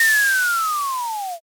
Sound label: brake